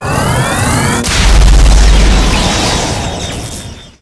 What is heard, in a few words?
Translocate